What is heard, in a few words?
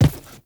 Crew Footstep Default; Warframe; Characters; Railjack Crew; Steel Meridian